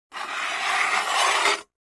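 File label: Large Tile Square Scrape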